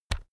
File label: keyboard external key push